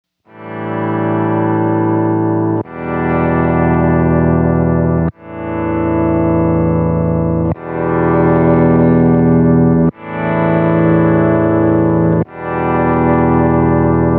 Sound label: auto swell, mobius